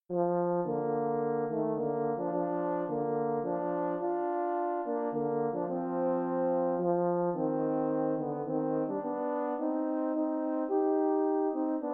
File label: hn duo